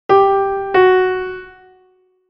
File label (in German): Intervalle sukzessiv